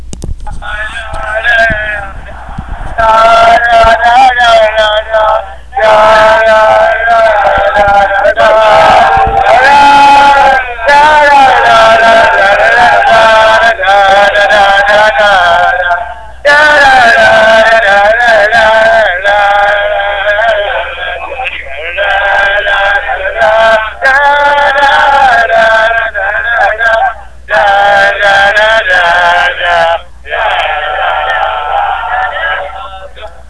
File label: bass chant